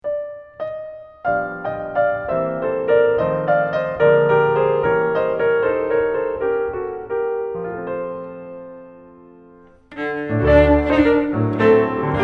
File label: Cello; Chopin